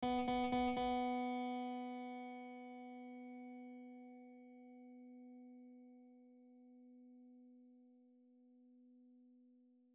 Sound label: Casio Headphones